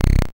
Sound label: YM Bass B, chip; ChipShop Deluxe; ChipShop Hits